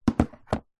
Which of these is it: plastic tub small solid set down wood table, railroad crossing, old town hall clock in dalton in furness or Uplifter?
plastic tub small solid set down wood table